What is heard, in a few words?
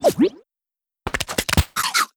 heal; Animation Throwdown